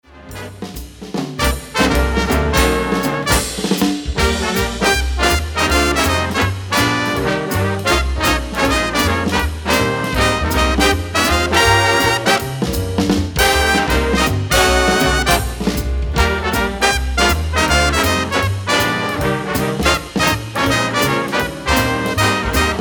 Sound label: swing